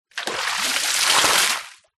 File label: nature water throw from plastic bucket onto deep water surface splash